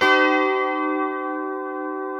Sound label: C#4 C funk chord, guitars; Spectrasonics; Hans Zimmer Guitars VOL-1